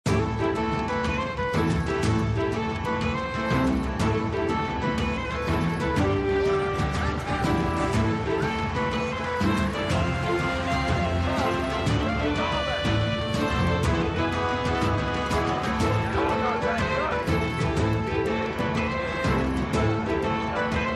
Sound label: free background; yule; captain